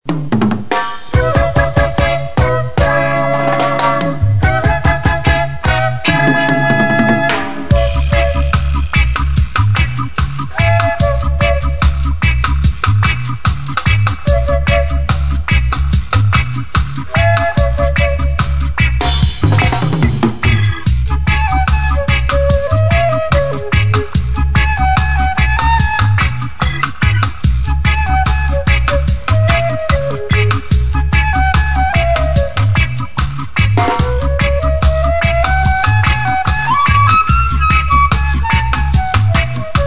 reggae flute

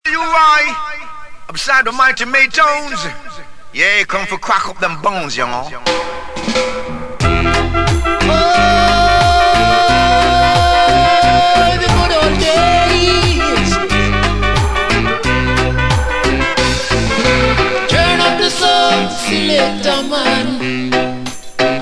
dancehall

